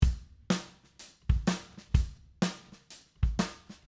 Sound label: Drum before; compressor